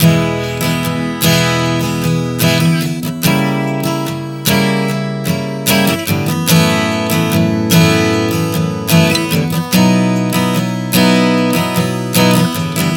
Progression 074 E-C#°-F#m11-B, Acoustic Guitar, Chord Progressions 074 bpm; Sonic Foundry; Songwriter Acoustic Guitar Companion